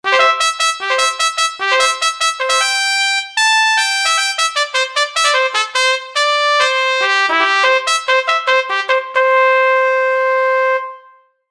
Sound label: jingle trumpet long